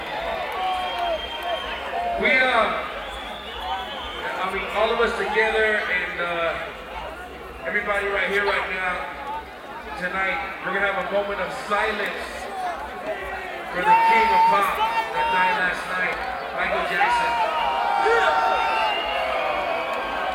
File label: silence